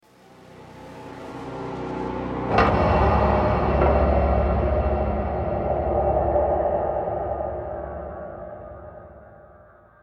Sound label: Stinger Decrease Action